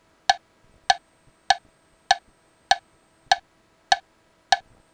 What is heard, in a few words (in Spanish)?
golpes; Educacion musical